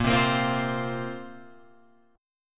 A sharp Suspended 2nd down Guitar Open F; strummed; A#sus2; JGuitar

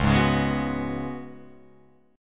C sharp 7th Suspended 4th C sharp, Guitar Open F; C#7sus4; strummed; JGuitar

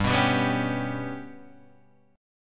G Major Flat 5th Guitar Standard, chord; GMb5 strummed; JGuitar

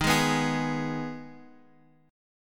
Eb Suspended 4th Sharp 5th Eb Guitar Open F; Ebsus4#5 strummed; JGuitar

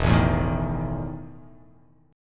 E Major 7th Suspended 4th

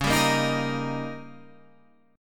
Eb 7th

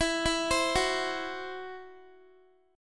E Suspended 2nd Sharp 5th, Guitar; Esus2#5; arpeggiated; x,19,16,17,18,x

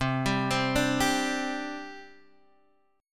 C Suspended 2nd C Guitar Half Step Down

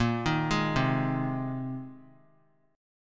A sharp 7th Suspended 2nd Suspended 4th A sharp; arpeggiated; chord; JGuitar

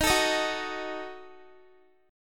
Eb Diminished, Guitar; Ebdim strummed

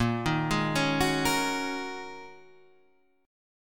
G sharp 7th Suspended 2nd Suspended 4th

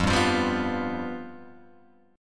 F Suspended 2nd Flat 5th, Guitar, Open G

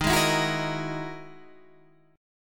E Major Sharp 11th D sharp

